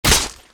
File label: p atk hawk